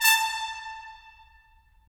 BRS, Tps Stacc; Roland - LA Composer vol. 4

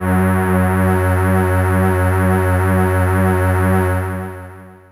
syn f#2, Ensemble, FAIRLIGHT

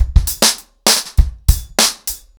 100BPM, ACOUSTIC DRUMS

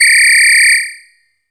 Samber Whistle; Zero-G Jungle Warfare 1, 2 & 3; 90 s Sample CDs

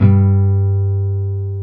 Jazz Guitar

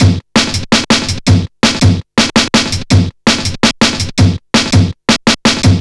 165 staccato, Drum Loops, Drum & Bass; 90 s Sample CDs; Total Drum&Bass

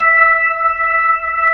B-3, Jazz

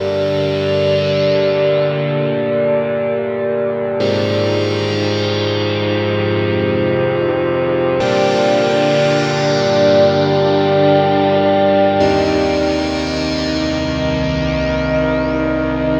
Pad, Synth Textures

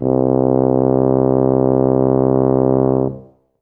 tuba C1; Best Service ProSamples vol.51; Classic Orchestra 2

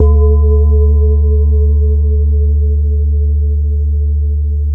BOWL, Tibetan Bowls

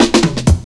120 fills; Sound & Vision - Gigapack I

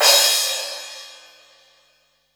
crash R; Zero-G; Chemical Beats; CRASH ZOO

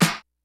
FUNK, DRUMS; Kid Nepro; Elite

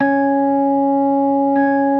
B3 C#5; Best Service Hyper Dance

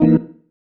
Stab One Shots, Organ

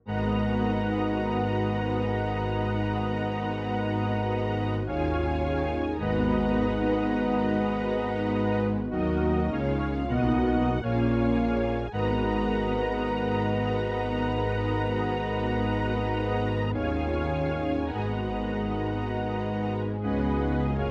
string pad, Smooth RnB, Ebmin; Big Fish Audio; Legendary; Happiness

